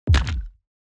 room damage kick

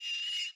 ambience room butchery pigs scream

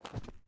mobs landings

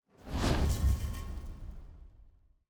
ui secret area open low layer

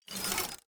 environment gate up

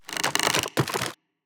tree golem big strike; Shelter

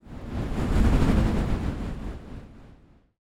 player grab spell start

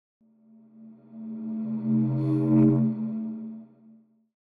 ambience room portal ending

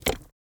submenu corridor open

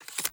battle preparation exit

